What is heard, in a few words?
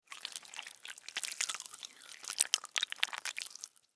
Worm, Crawl, Movement, Slimy, Wet, Insect, Bug; Worms; Animals:Insects; Sound Effects:Animals:Insects; Blastwave